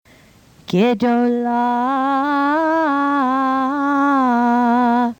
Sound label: Haftarah G'dolah; bnaimitzvahprep